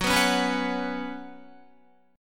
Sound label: F sharp Suspended 4th, F sharp, Guitar; strummed; 13,14,13,16,13,14; down; 4F,4A,5C,5G,5C,6E